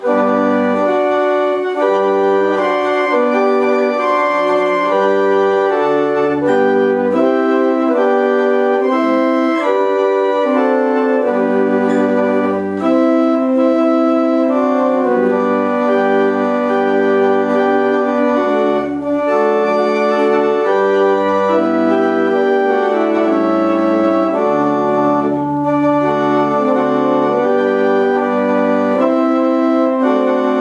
barrel organs; Trottiscliffe; Benediction

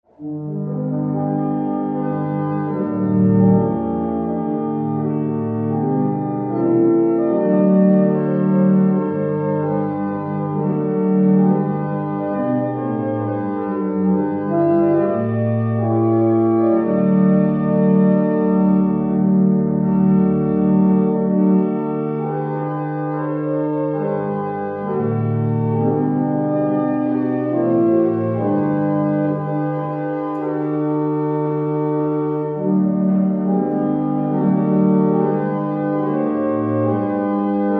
barrel organs; Barnston; Salem

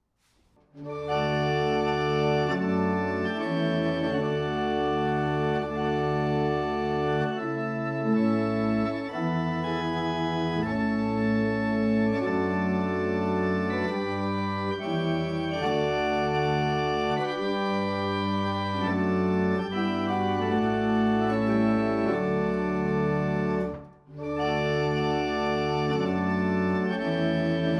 Double Chant, barrel organs; Quantoxhead

